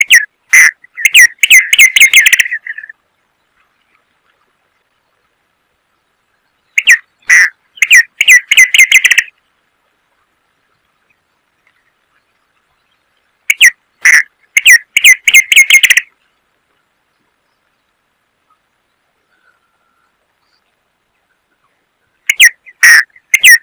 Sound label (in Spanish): siete colores de laguna; Tachuris rubrigastra